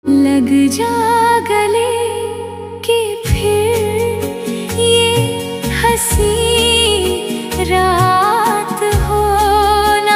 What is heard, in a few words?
AI Ringtone